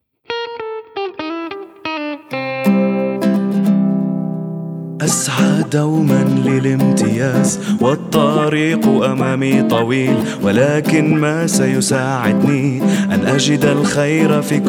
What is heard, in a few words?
Song, AR